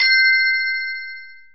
win; Quentin C's Gameroom

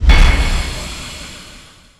Menu Scifi Enter; Menu FX

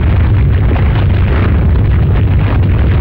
Quake Rumble Loop; SFX Kit; Destruction

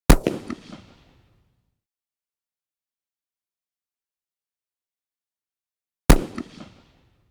.223 Rifle Blasts Medium; Hollywood Edge; Heavily Armed

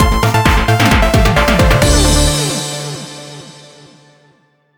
MUSIC EFFECT Space Synth Negative; stereo; Universal Sound FX

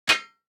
DICE on Metal, Drop, Standard, 3 Three Dice; Samples; ArticulatedSounds; DICE Sfx Party (Extended)

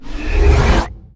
Scifi Dome Shield Deactivate; Energy & Forcefields

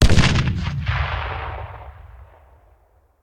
Grenade Hand; Sound Ideas; Guns

